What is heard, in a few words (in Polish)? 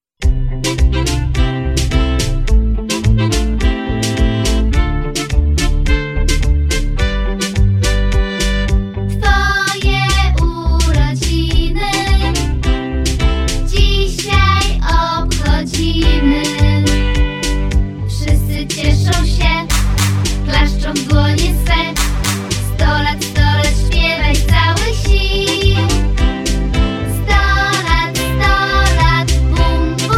Urodziny wokal zwolnione tempo